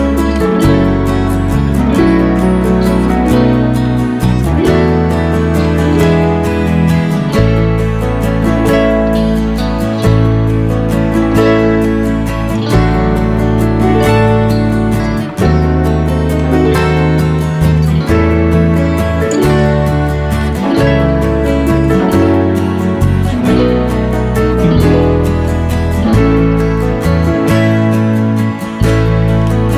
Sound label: Irish Traditional, Irish Lullaby, backing tracks